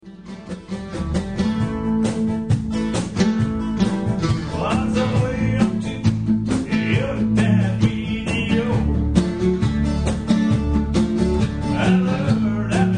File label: Cello